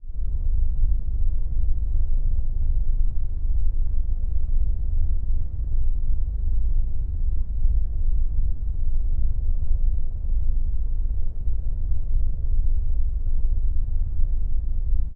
Space Ship Ambient; spaceship fx

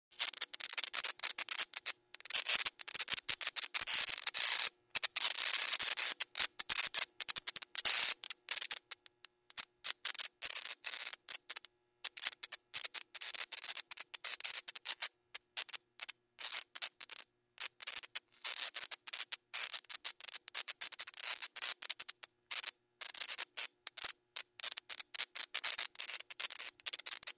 Zaps 2 Small Cracklin

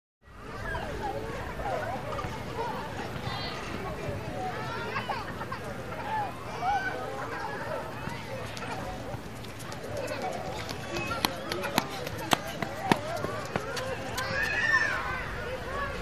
Beach Ambience Board, beach

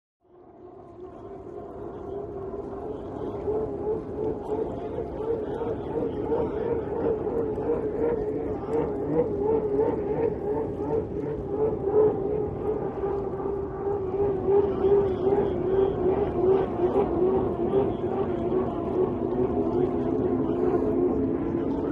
Hydroplane Dock Amb

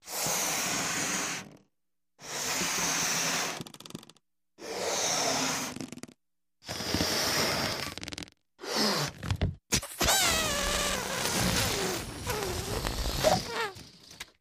Balloon Inflate Defl; balloons

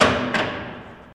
Jail Door Open; jail & prison doors

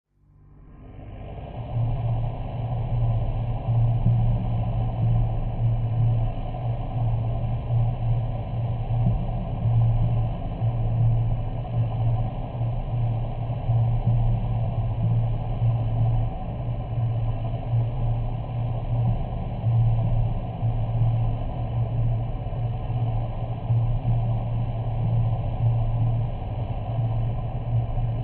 Music Eerie Low

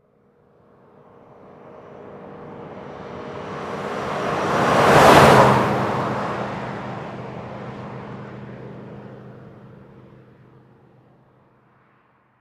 Expedition 50 Mph By, ford expedition

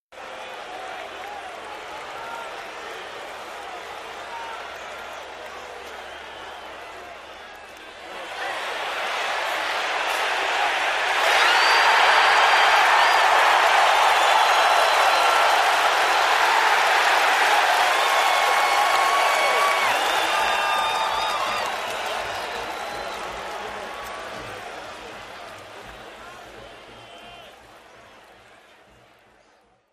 Football Crowd Idle, sports arena football

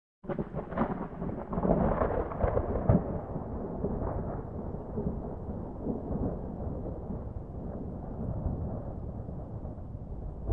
Thunder Crackle, distant

